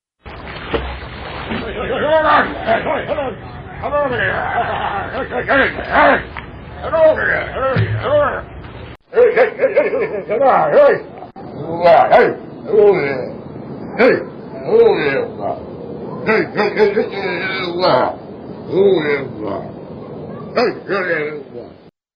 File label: Hoover